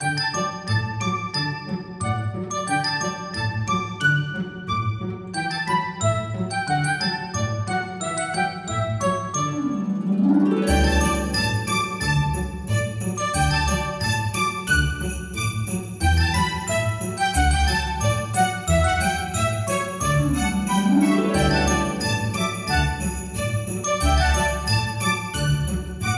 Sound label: Exciting christmas celebration